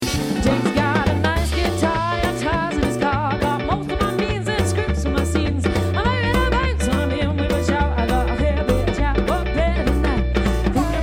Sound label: swing beats